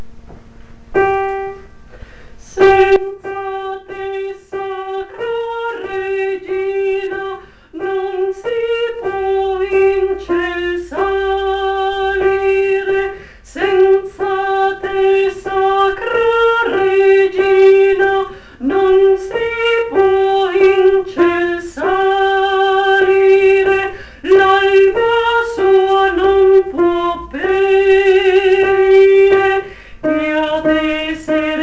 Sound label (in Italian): contralti